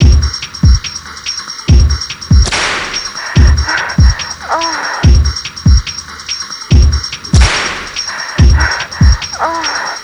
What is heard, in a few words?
Club End Music